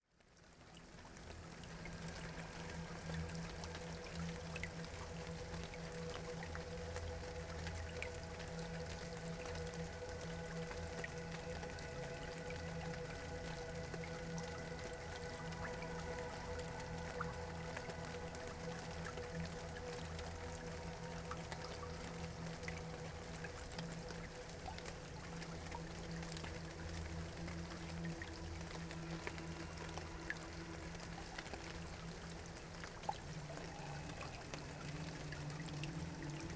Ambient Rain

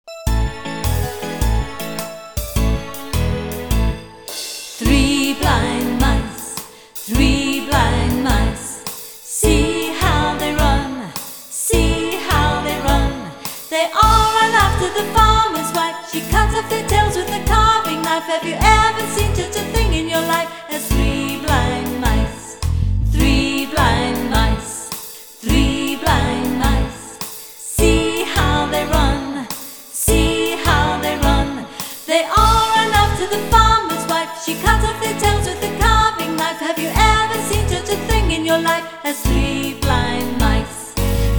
Children Singers, Three Blind Mice

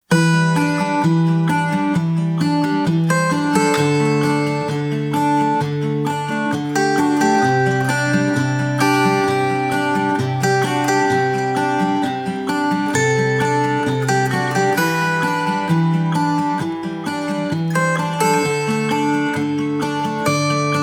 Guitar

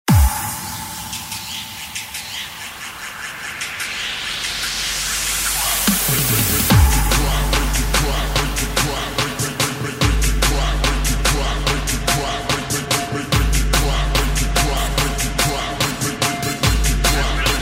Bass Boosted, Bass Bombs

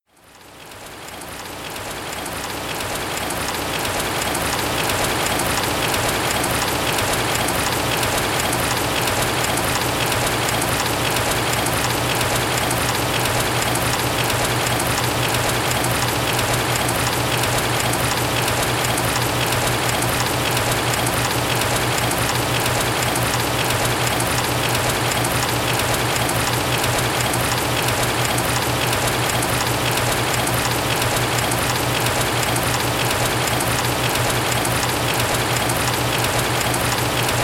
Morning Raindrops

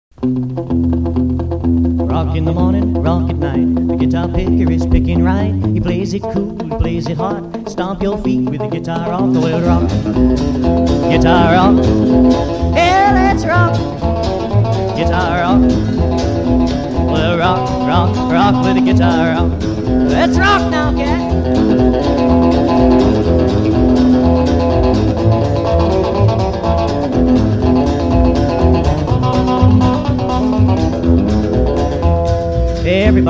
Guitar Rock

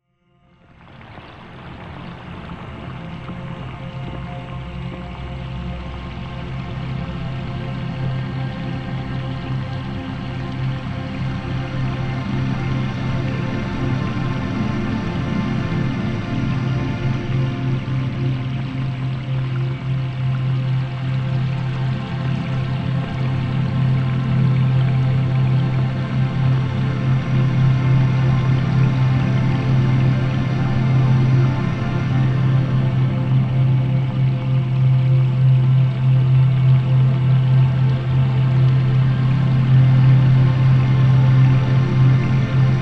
Thunderstorm

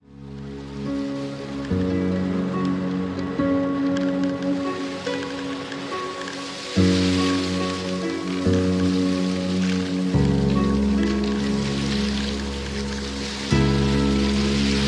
Irish Celtic Music Nature Ambience

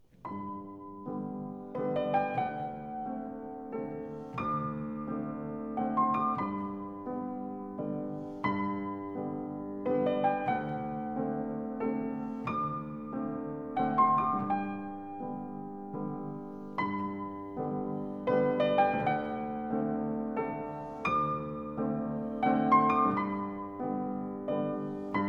German Dances in A-Flat Major; 6 German Dances D. 970 No. 4

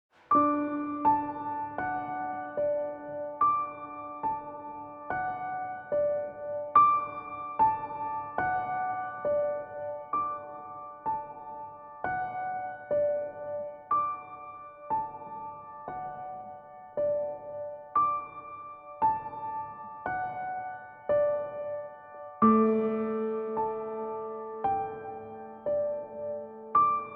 Piano

